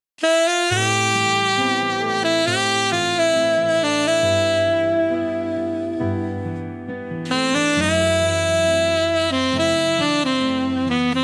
velvet saxophone

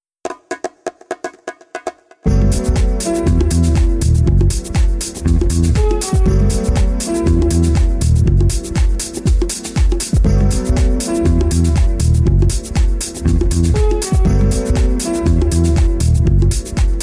vocally 432 hz

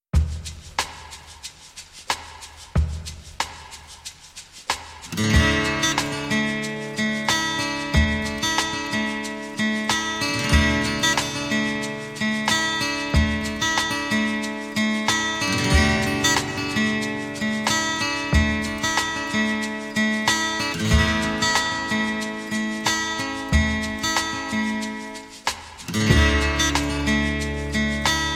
acoustic vibes; 432 hz; it rained

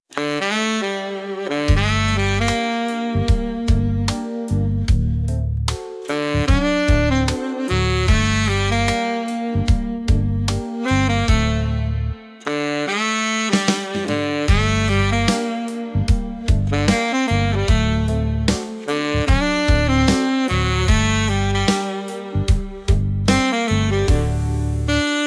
saxophone dreams

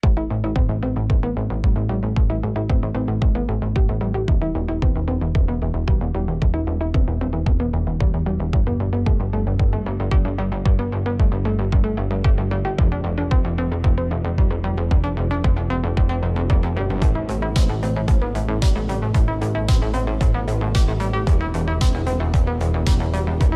Electronic Impulse

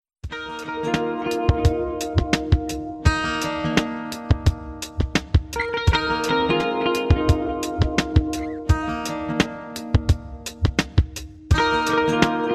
vocally 432 hz

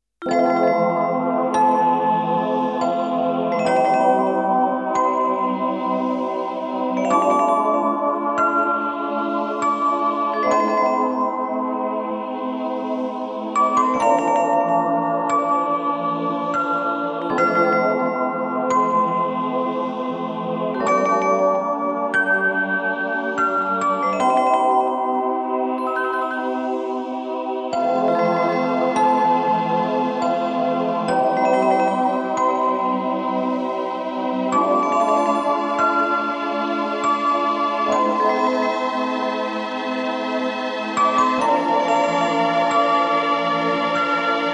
spa music 432 Hz

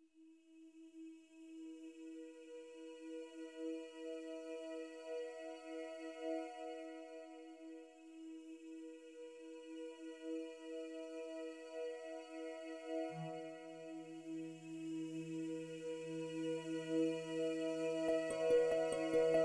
vocally 432 hz

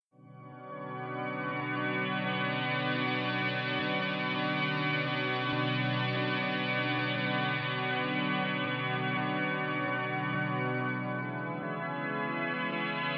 warm walk 432 Hz

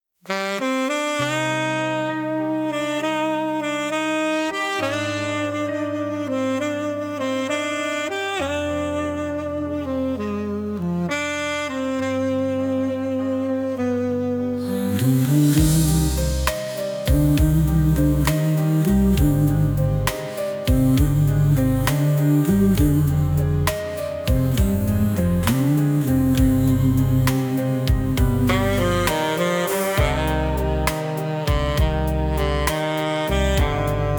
sax

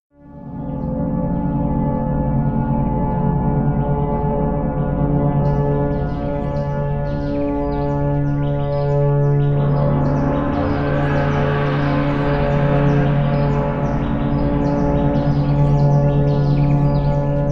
spa music 432 Hz; magic centre

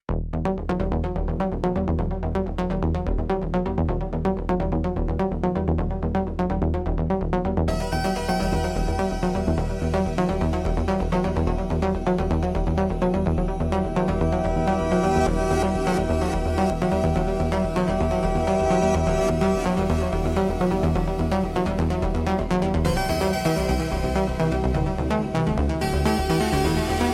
Electronic Impulse

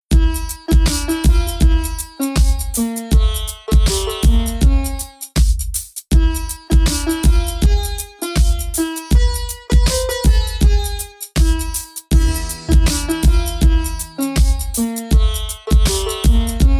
sensual rhythm shake your hips 432 hz; Potrząsaj biodrami

